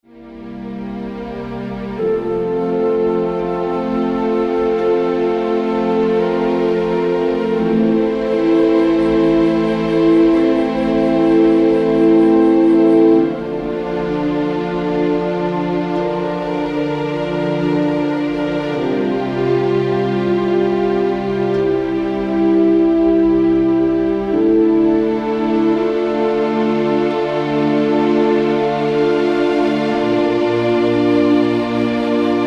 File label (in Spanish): orquestal emocional